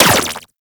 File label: Laser Fire; Warframe; Corpus; Moa Laser